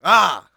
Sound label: get hit voice; UFC Tapout